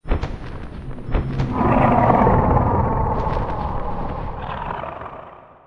wait, fire dragon

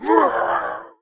fall; monster; skeleton general

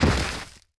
drop, bear

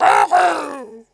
fall; gold monkey

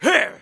attack, monster; thiefboss1

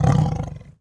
demage, tiger; monster

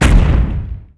dead drop; spider king

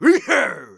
attack, monster; thiefboss1

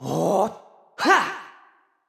shaman skill

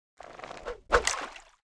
throw, fishing; sura

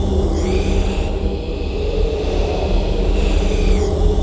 stone loop

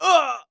fall; sura

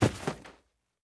drop, barbarian infantry, monster

